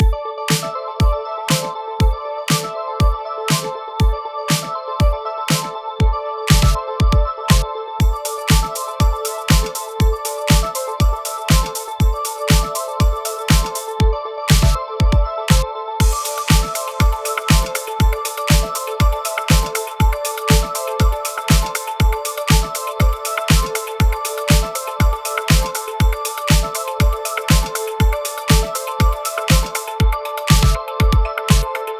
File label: bells